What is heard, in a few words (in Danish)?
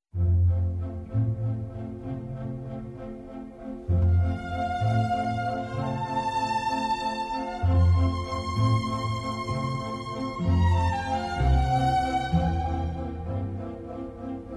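Mozart Pianokoncert 21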